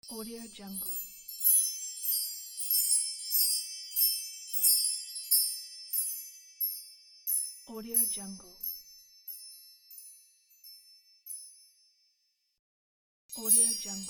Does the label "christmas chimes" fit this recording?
Yes